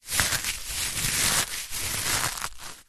hacky sack footbag handle squeeze crunch

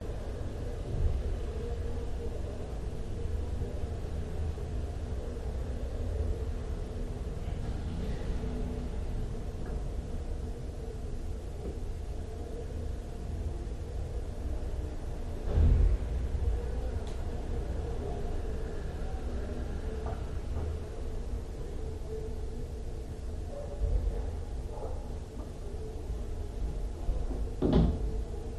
Apartment Roomtone Bedroom; Greek; your free sounds

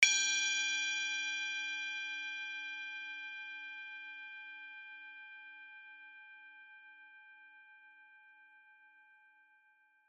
Tibetan Hit; noise creations

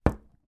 food large pepper mill place down on table

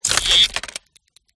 CYBER TECH; PMSFX

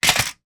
toy plastic truck trailer drop into toy box

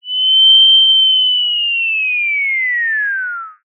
cartoon descend fall whistle

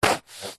foley foam block dense stab sharp knife

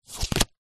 leisure playing card turn over on top of deck

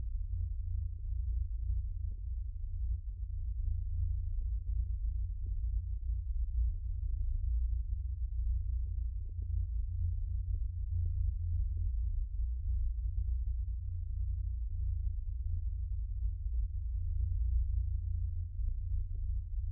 Drone atonal thrumming deep bass hum

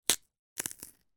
horror rip tear limb arm body part; zapsplat

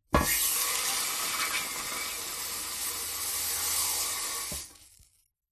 food lemon slide drop onto hot metal sizzle; zapsplat

